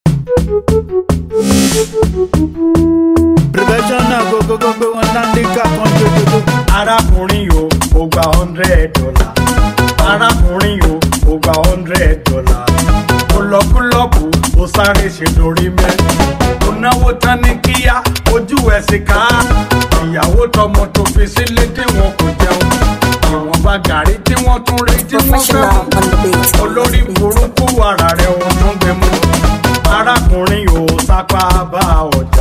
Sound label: Professional beat